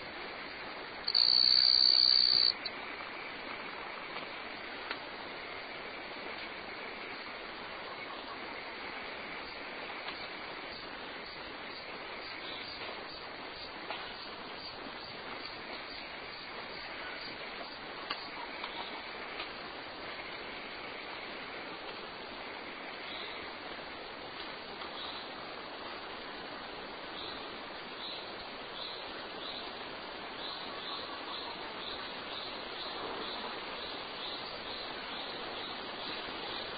Andinobates geminisae

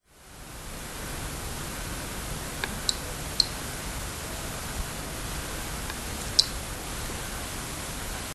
Mantella pulchra

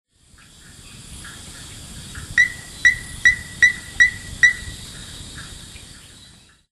Spinomantis aglavei

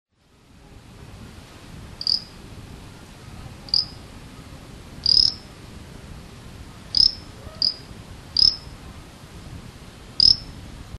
Mantella bernhardi

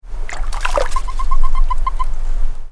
Anaxyrus boreas halophilus

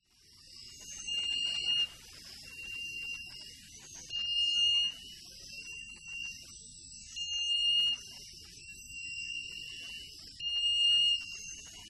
Cophyla berara

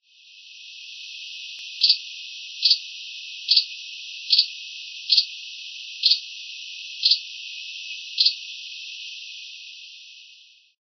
Mantella expectata

